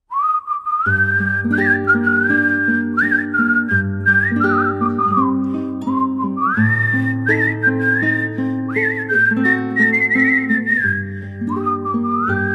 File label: romantic whistle